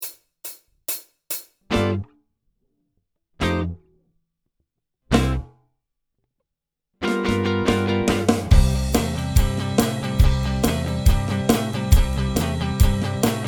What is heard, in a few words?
looking back solo backing